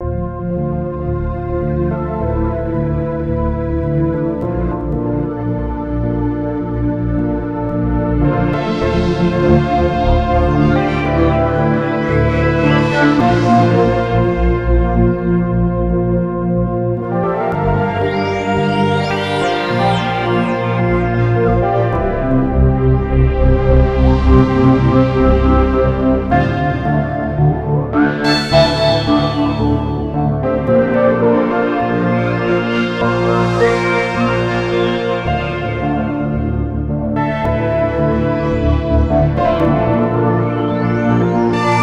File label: Evolving Mood